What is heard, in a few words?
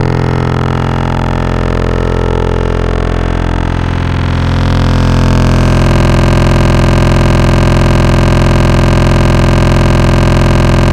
Growl Beats; Wavetables; Synthetic's Serum Collection; ESW Core Wavetables; Echo Sound Works Core Tables